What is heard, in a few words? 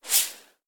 bullet passby